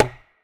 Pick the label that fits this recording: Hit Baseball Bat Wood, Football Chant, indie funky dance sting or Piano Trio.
Hit Baseball Bat Wood